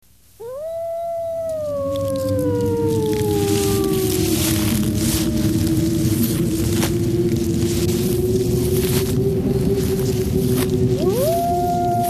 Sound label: Wolf Attack; Halloween; Hallmark Halloween 1989